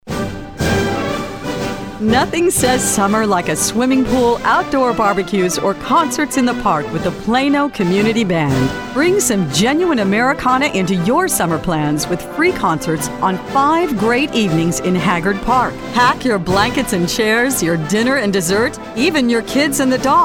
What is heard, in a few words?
Community Band concert; Plano